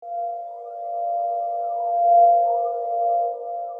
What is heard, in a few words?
closed space; Seer; GamigoNA